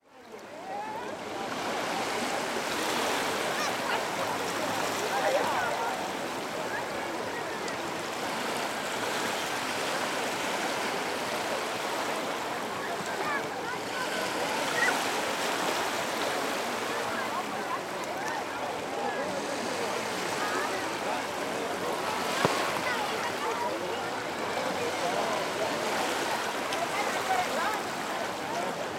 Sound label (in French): grado italie